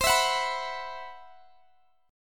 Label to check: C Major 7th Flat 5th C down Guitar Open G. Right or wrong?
Right